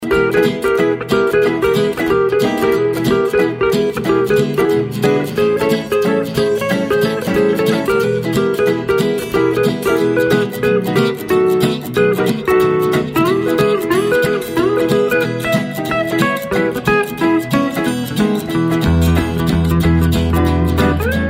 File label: guitar boogie